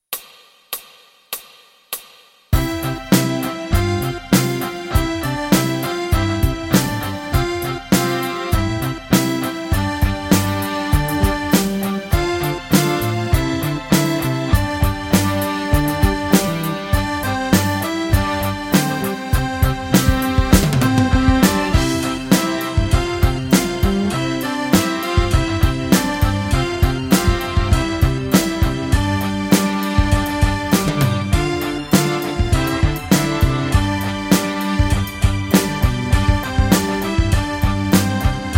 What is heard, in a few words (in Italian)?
Fisa tutti